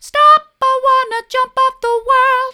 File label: stop i want to jump off the world soft, vocal